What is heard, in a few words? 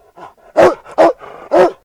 bark; gcompris; memory-sound